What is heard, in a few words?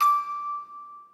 musicbox